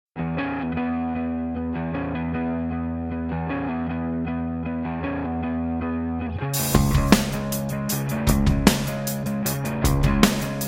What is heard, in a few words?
Rock Pop Band; Surrey